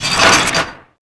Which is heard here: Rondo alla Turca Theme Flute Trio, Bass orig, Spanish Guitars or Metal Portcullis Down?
Metal Portcullis Down